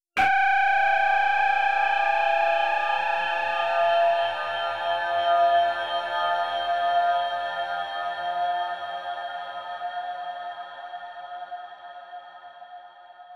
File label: transporter; TOS